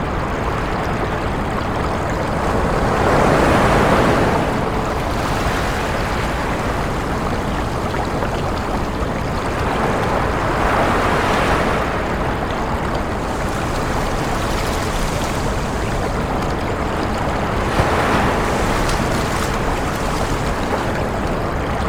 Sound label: ambience city